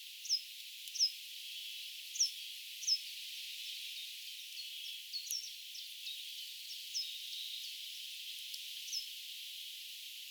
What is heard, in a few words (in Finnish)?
järripeipon huomioääntä